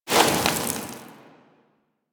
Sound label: Sword Elemental Fire Whoosh; Warframe; Teshin; Weapons